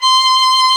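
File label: viola and violin, string quartet; 90s Sample CDs; Club-50 - Foundations Roland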